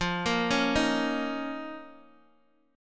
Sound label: F 7th Suspended 4th Sharp 5th, Guitar DADGAD